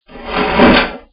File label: open drawer